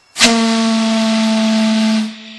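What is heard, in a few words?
large whistle, foghorns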